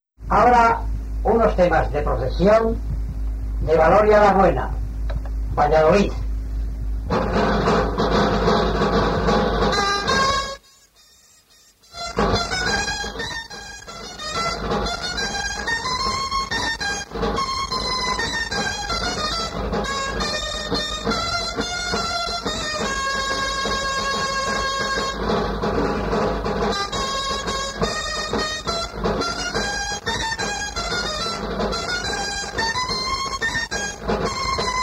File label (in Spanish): Danzas; Danzas de Valoria La Buena; Agapito Marazuela